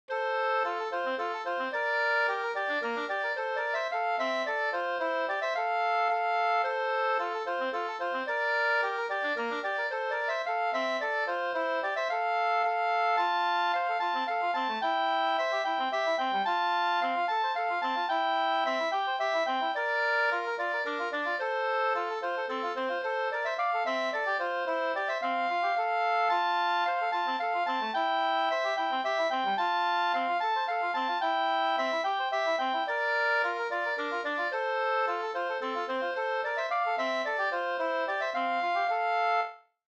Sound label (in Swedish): slängpolska